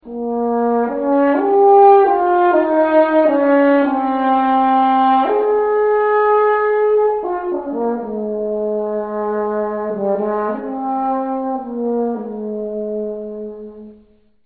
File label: french horn; NH Philharmonic Orchestra